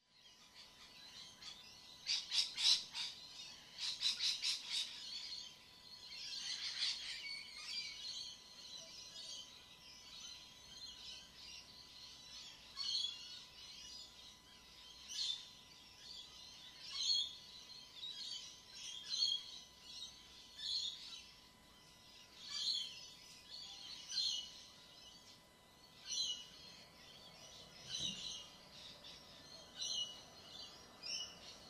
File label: Dawn Chorus